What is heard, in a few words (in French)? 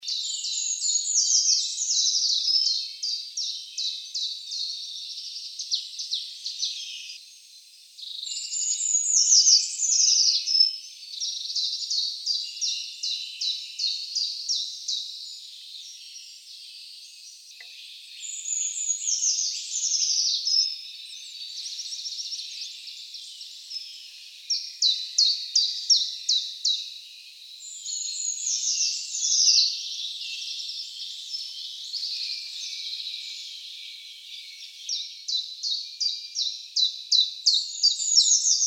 Grimpereau des bois